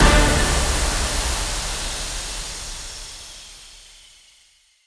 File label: big Win Start